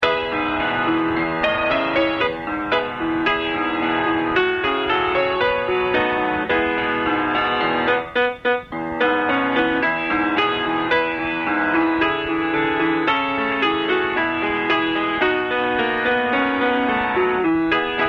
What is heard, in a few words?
anthem